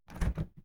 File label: Refrigerator open